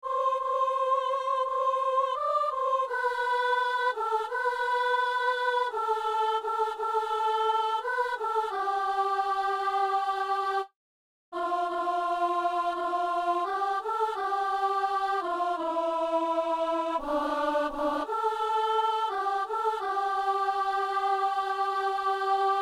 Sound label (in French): soliste